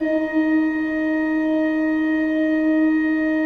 D3, Cathedral Organ, ROHRFLOETE; Propeller Island

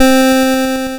NOTE B3, LSDJ; LIMAT LSDJ kit; LSDJ Notes; Drums

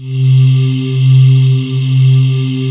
SPACE VOX, JV PADS; Pro-Rec - Synthsonic

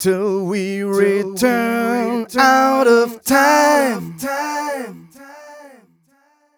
130 mel g, Vocals

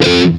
METAL SINGL; East West; Global Sounds

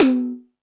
Dance 2000, Drum Machines E-MU Pro-Cussion

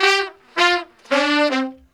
BRAZ LICK, BRASS LICKS

